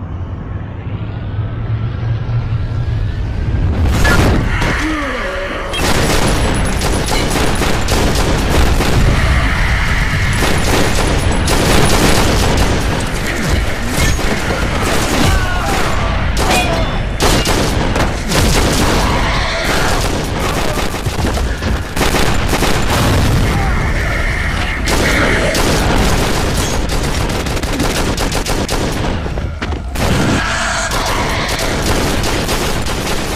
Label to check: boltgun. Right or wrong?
Right